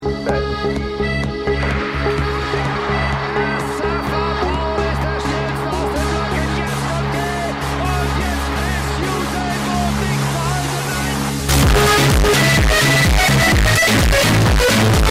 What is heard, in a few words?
bass music